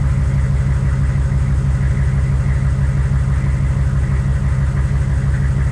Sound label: Humvee Idle Loop; Trucks